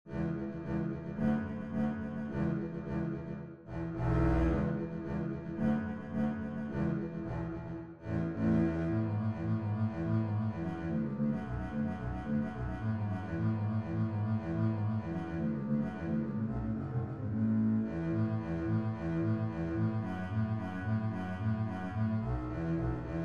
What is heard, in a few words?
fiddle bass